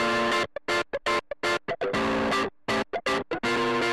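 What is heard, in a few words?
wet guitar funk, Multiband neural audio effect; Demo without amplifier; Wet-NoAmp; prism distortion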